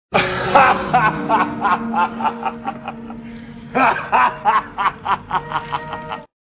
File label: crazy laugh